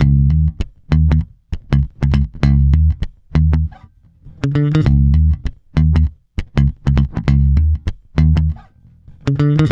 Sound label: D#, mute, bass; Spectrasonics; Bass Legends; DEF MUTE